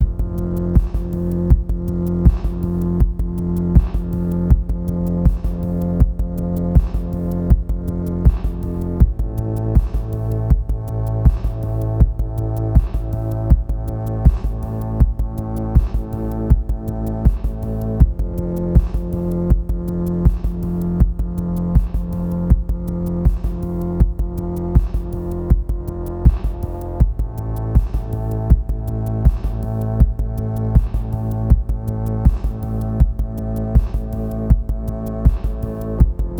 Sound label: space Flight